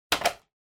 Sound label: Intercom Handset Pick Up Strong